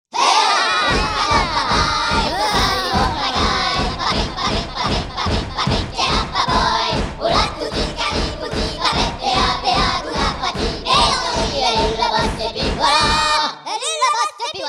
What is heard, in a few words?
Another Irish Drinking Song